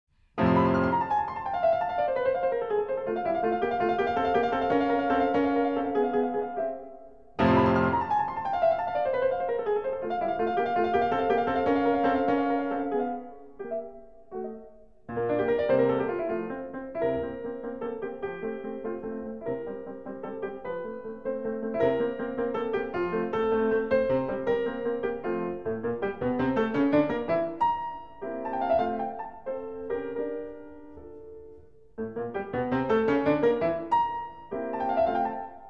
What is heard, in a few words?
Piano Sonata in F Major, Allegro assai; Piano Sonata No. 12